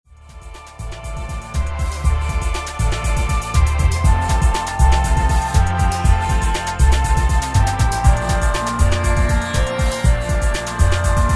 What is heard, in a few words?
peruv sitar